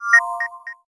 News, notifications; LG G Vista VS880

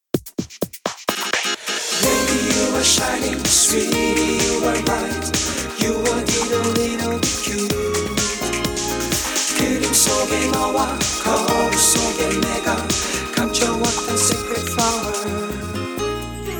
Shining, ringtones; Samsung; Galaxy A SHW-M100S

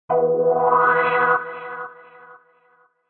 Incoming, alarms; NIU; N109 Niutek

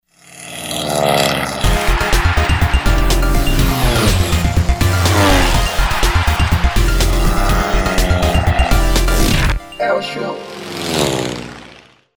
Samsung phone tone; SGH-Z130; Air Show

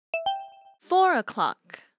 Hourly Alert

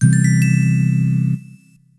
power melody; Netgear SPH200W Skype WiFi Phone; SystemRingTones; poweronoff

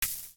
Leave application grid; Audio themes

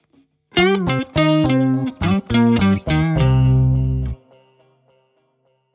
Guitar A; Kodak Smartway L2; ringtones

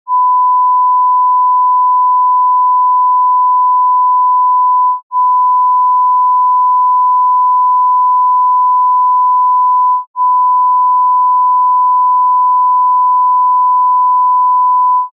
1kHz L 0dB, test sounds; LG B470

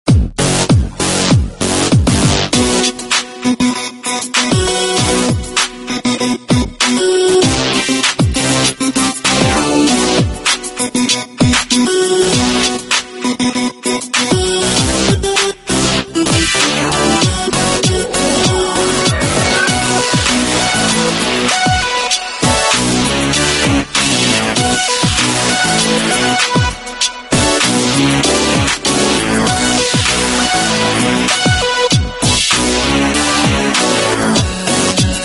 Samsung; Samsung SGH-A187

